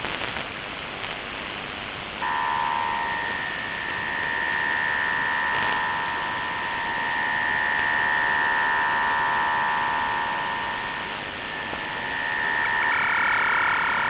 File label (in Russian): zapisi signala apparaturi te-204 usc-11